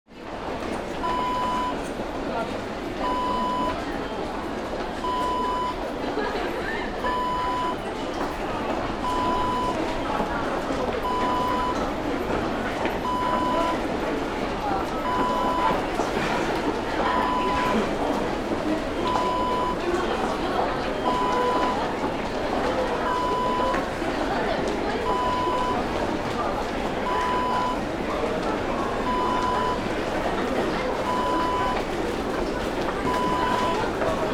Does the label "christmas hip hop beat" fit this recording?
No